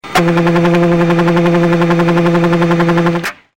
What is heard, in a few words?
Airbus Flute